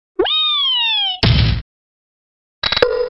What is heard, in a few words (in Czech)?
PTAK; GryTygryska